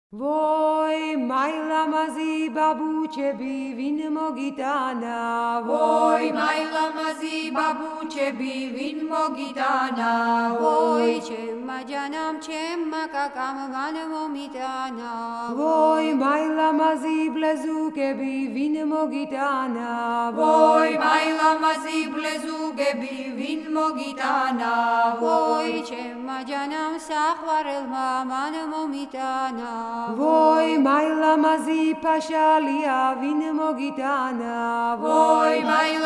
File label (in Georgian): vin mogitana shavshuri saqorcilo; Mzetamze